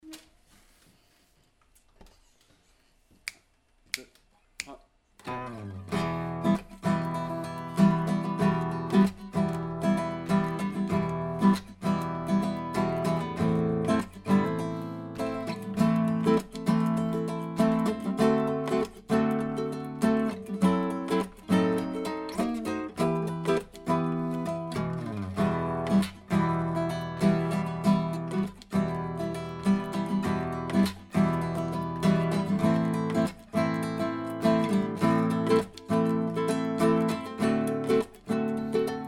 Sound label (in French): accomp